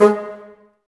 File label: Bassoons G#3; Miroslav Vitous - Woodwind Brass Ensemble; Bassoons Links